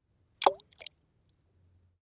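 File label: Splash Sml Pebble Plop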